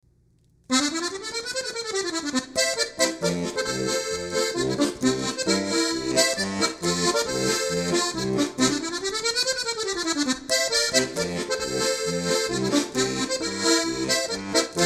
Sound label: Button Box, accordion